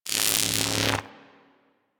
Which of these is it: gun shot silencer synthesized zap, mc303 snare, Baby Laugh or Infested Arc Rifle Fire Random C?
Infested Arc Rifle Fire Random C